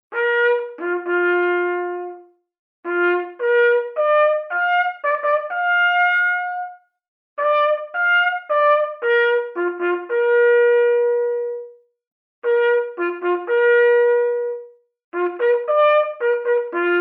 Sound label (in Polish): hejnal; tucholi